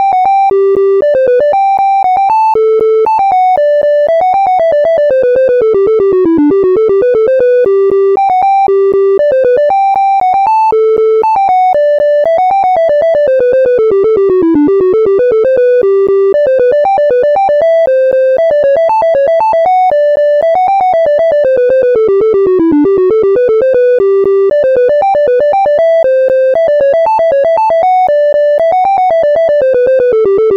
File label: sailor triangle; PySynth